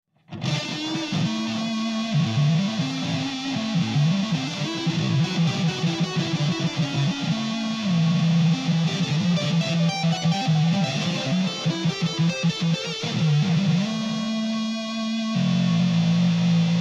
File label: Lizard Fuzz; ScreamingLizard